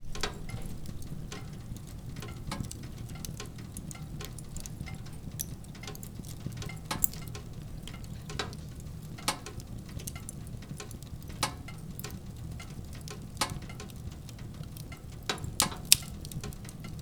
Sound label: Fire in Fireplace